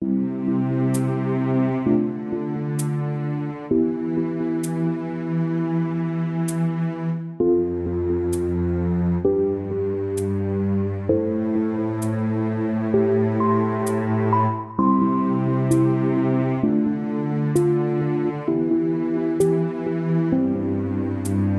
become sleepy elepiano

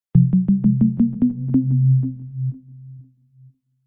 Water bubbles

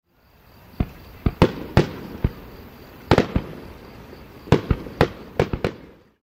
Small Fireworks

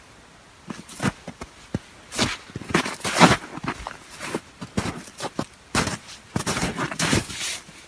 Walk on the snow

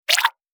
picha water

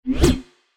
von sword

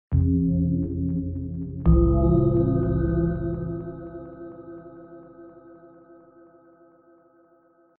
Like water falls

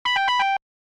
Correct

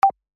pipopa

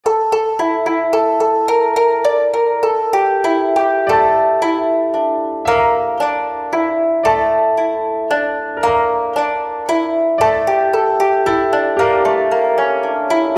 Solemn

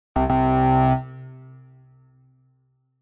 Wrong

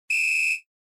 Long whistle

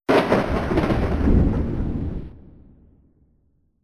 Thunder

